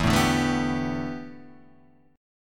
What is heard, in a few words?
A 9th Suspended 4th E